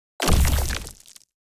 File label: successful build